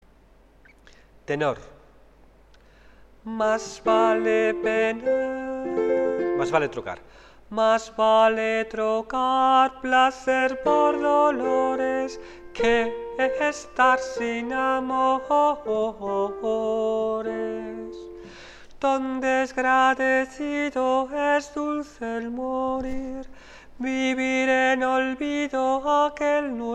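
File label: tenor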